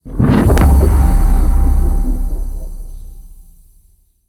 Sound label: mega win; arcane